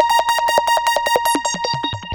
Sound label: Korg, FX